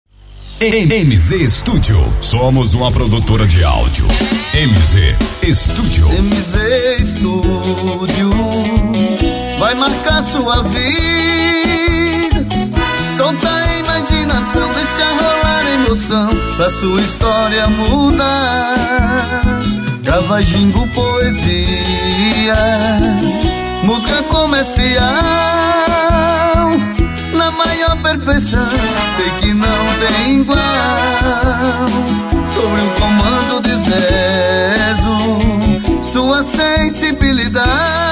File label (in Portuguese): jingle turismo; fragoso